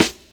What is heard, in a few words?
SD, dance drums hip